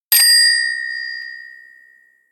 bell chin

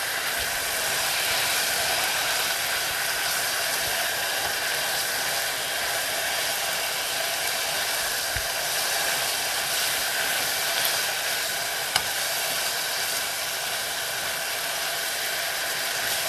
fry the meat

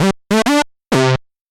closing end

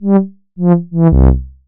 carefree end

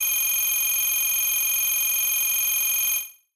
laser light

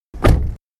car door

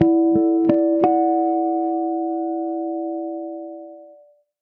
harmonics

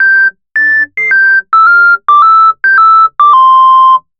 switch

